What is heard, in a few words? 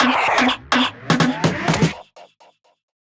Drum Fill 125 BPM